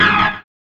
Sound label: SYN Limit; Best Service Gigapack 1